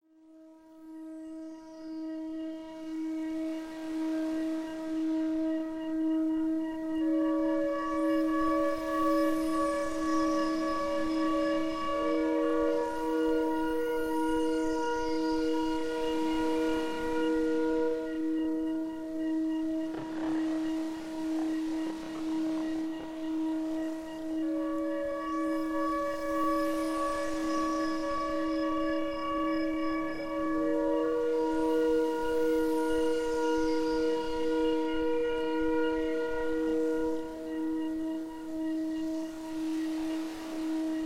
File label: solfeggio harmonics, 852 Hz